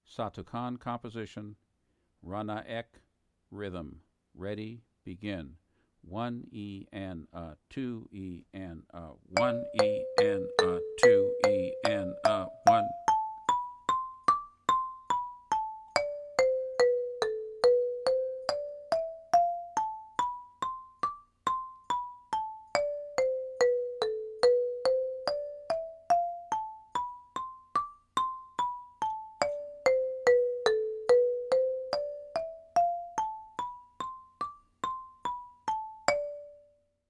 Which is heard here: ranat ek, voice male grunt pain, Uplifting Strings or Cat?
ranat ek